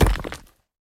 Weapon Stone

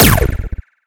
Shoot; Skylanders Cloud Patrol; Arcade, iOS, Mobile; EyeBrawl SFX

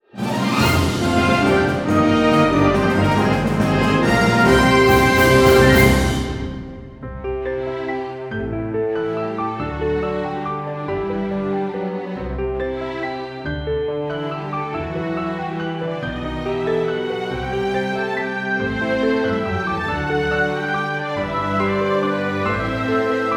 theme victory; Cris Tales; PS4 PS5 Stadia Switch Windows Xbox One Xbox Series X/S

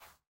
Fly in land; Monmen

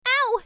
Bumping

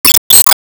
Gimmick Thunder wall Hit

